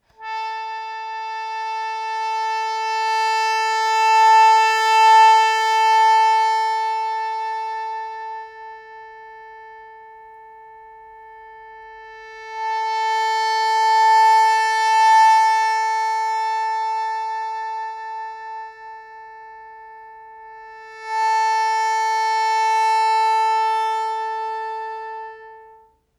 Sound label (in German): Bando Ton an abschwellend, bandoneon